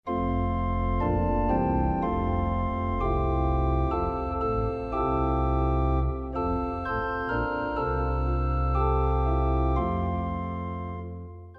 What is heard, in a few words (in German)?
Nun danket all und anfang